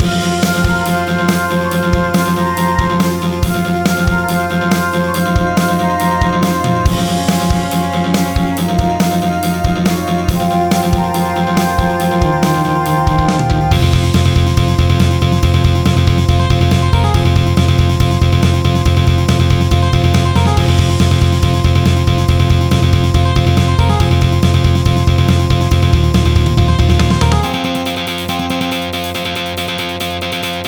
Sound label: music boss